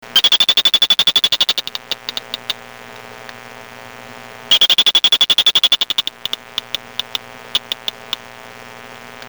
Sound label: P vittatus Warning, chants